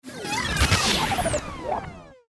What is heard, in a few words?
Distortion